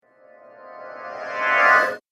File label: Fairy Draws Magic